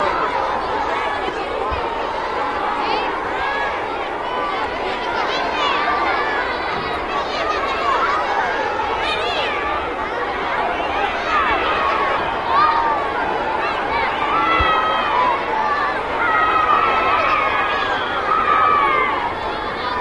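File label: Children Distant, kids play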